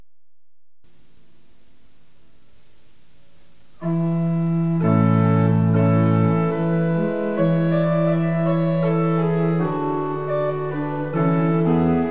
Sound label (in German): Interludium Orgel